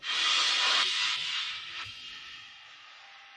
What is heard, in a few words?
gong